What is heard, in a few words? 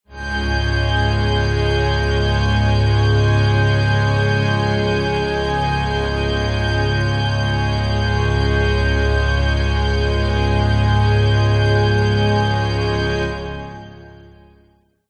horror suspense strings; zapsplat